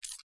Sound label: hg mag out